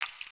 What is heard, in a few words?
Click